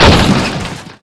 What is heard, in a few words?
Crash; FSR 2011